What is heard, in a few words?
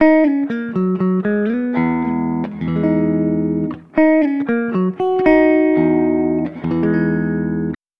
guitar bus force low end density; Arturia; Arturia FX Collection 2